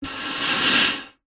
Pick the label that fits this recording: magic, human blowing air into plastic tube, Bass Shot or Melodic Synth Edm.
human blowing air into plastic tube